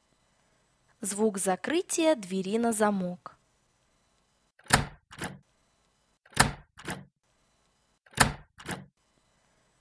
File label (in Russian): Звук закрытия двери на замок